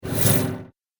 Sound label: Space Drum Whoosh; Sci Fi Sound Effects; Science Fiction Sound Effect; Andrew Fleming; Twistedtracks